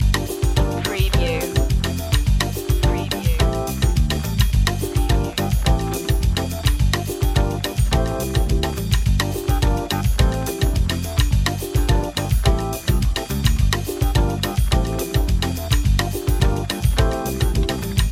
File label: classy night loop; brisk